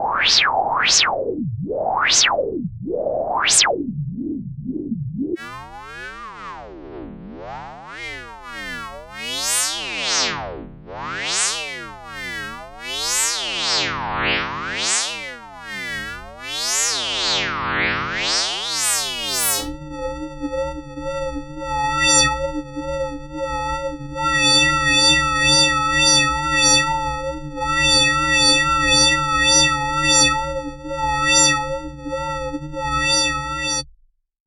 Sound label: Filtered Noise; Hologram